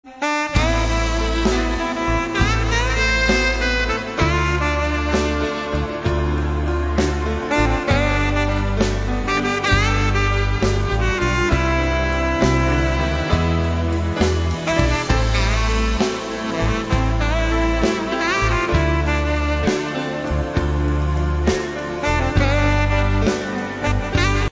ballad

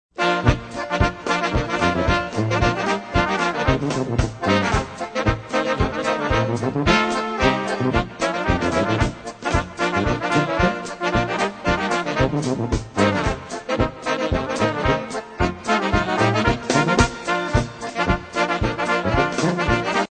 SAUERKRAUT POLKA

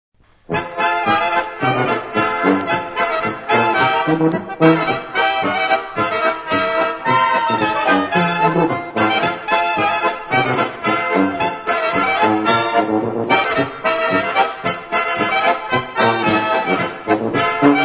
POLKA

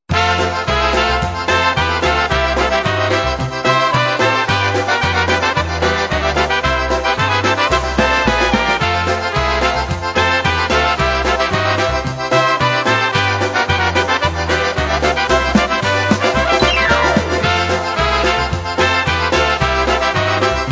polka

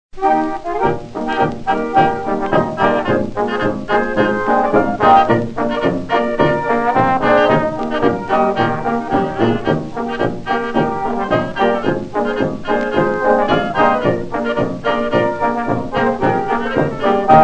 old time polka

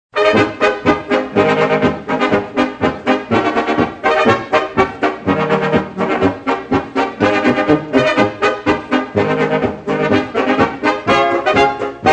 polka

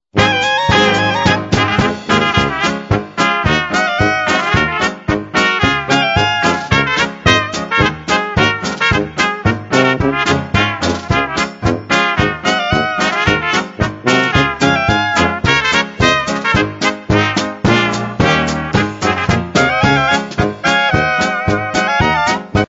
POLKA

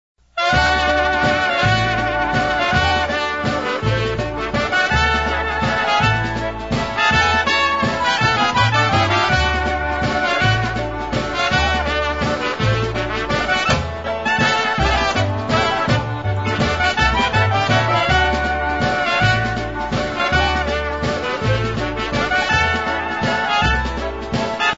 WALTZ

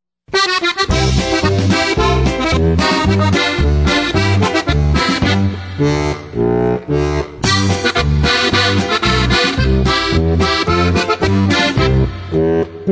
tuba polka

